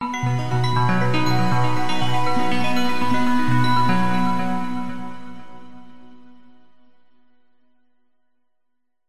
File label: multimedia logo musical synth arpeggio bell like warm trusted; zapsplat